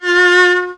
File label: VIOLON F#3, Arabian Traditions; USB Soundscan vol.43; AKAI